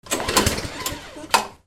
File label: GARAGE